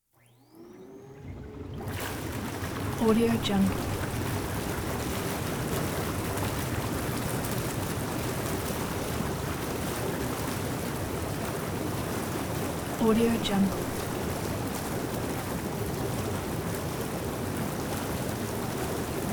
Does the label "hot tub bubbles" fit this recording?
Yes